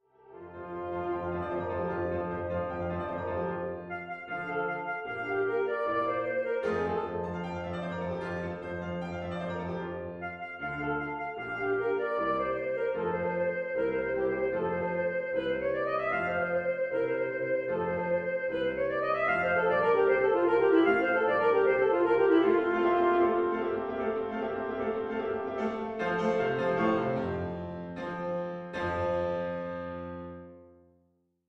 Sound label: Concertino w PIANO